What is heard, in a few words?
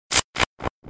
FX Space Cartoons